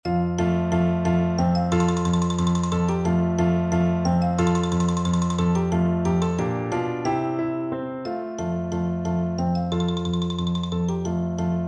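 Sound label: xylophone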